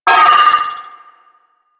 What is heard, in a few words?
Trooper Vocal Pain; Warframe; DE; Sentients